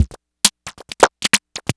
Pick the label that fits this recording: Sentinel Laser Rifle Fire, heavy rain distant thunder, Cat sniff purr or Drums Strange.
Drums Strange